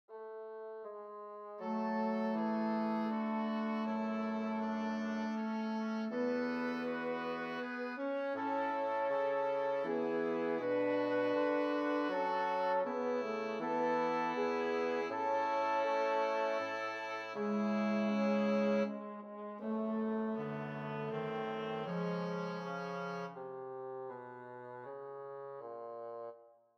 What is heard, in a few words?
5tet